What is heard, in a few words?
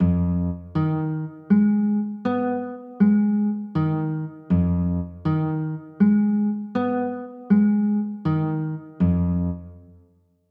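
F m7b5 chord, guitar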